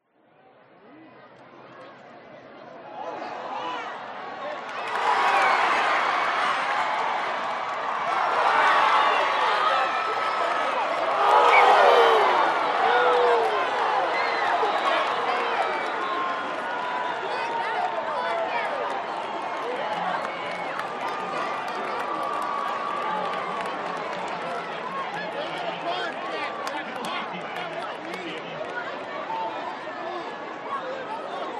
american football crowd shouts oohh x3 close fails followed by announcer